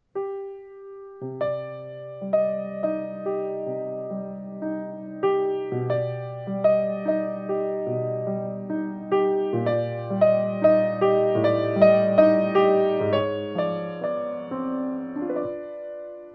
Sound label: Piano; La historia oficial